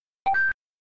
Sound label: CLICK; R-TYPE DELTA